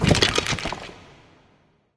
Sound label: hades death bones fall; smite